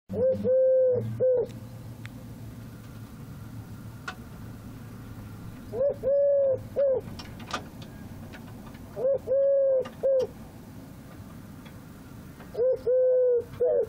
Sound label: Tourterelle Turque Eurasian Collared Dove Chant